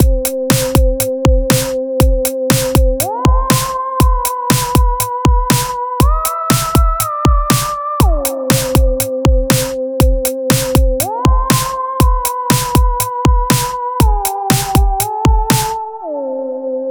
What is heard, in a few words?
SH-101 Opera Lead